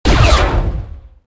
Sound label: alt fire